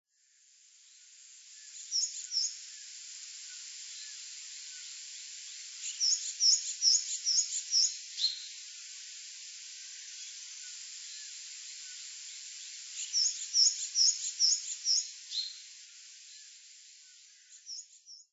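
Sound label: Ishigaki Japanese Tit, ishigaki; ishigakishiju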